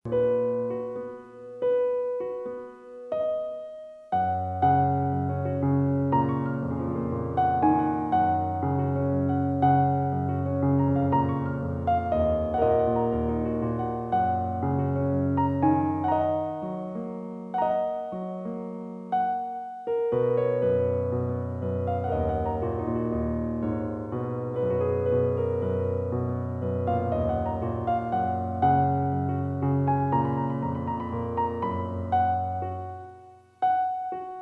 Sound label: Piano